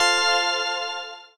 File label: autoplay on